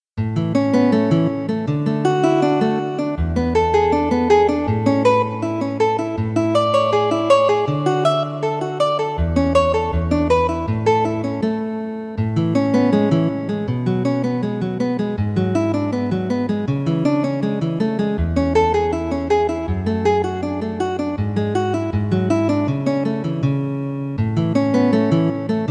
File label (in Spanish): guitarra; Lecciones – Libro 3 – n° 1 – in Do; L.Alvarez